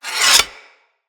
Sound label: Axe Sheath; Weapons; Foley